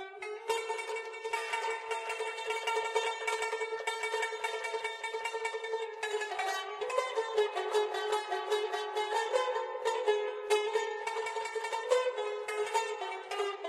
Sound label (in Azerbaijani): bayati kurd; leyli